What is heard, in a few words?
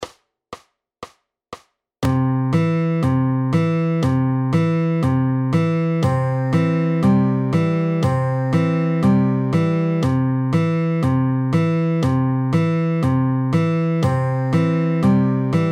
Fast, fingerpicking